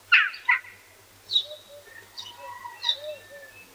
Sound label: Birds Various, Birds; outdoor